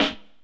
alt snare

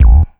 Kick Kick Acid

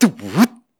Beat Box Scratch

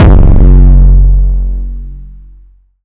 Kick Dist Rumble; Nexus6

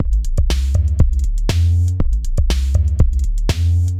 electro, pitchglitch loop

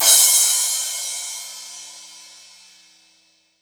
Crash Nice Trash

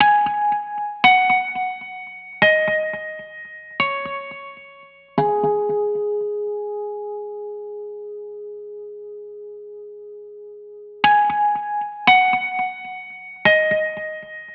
Guitar 174bpm Gbm, LIQUID; DNBACADEMY

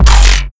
Kick Blade

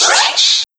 Scratch; pitchglitch samples

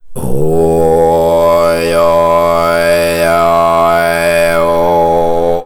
Vocal Tibetian Mid Throat Chant

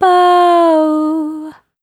Vocal Baowuh G sharp 147

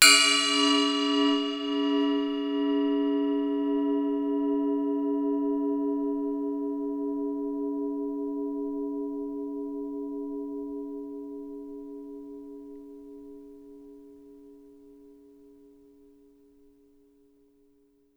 Cymbal Super Long; Samuelgremaud; pitchglitch

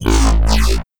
Arguing Transformer

